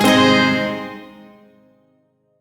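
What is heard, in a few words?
Shawzin Minor Chord; Warframe; Duviri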